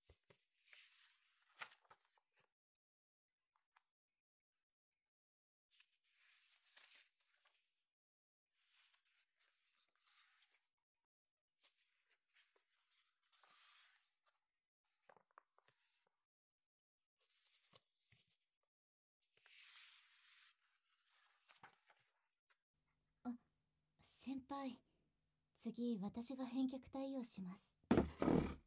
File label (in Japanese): 耳舐め; お礼にセンパイのオカズを教えてください; 昨晩のオナニーのオカズを詰問される／チンポ実況; 【淫らな素顔に骨抜き】清楚な後輩はめちゃエロくて破滅的にヤバい子～NGなしで滅茶苦茶にして～